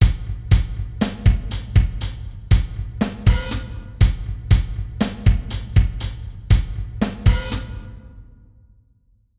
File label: 60 bpm, batteur